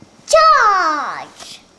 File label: Charge